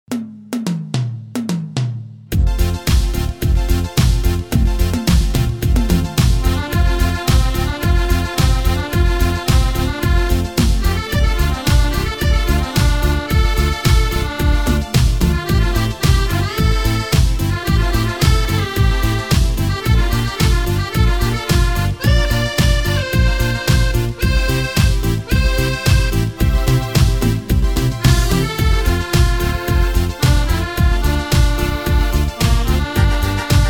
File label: medley, K800